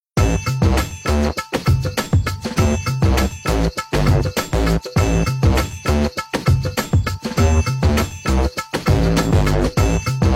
Bass, Ringtones; Benq-Siemens EF81; Grand Master

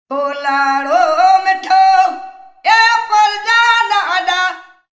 Tribal Wake up, Ringtones; Siemens M75

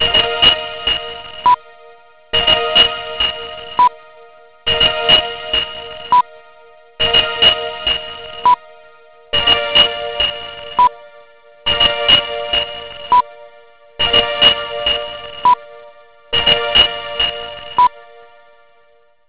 Siemens ringtone; Siemens M75

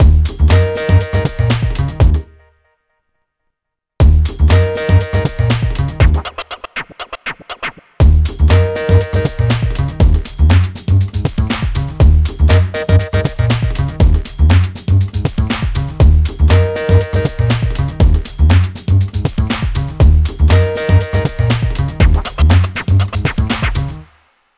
Funkerific, Ringtones; Siemens S68

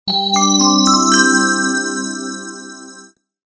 Bells, Ringtones; Siemens M75

